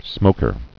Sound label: smoker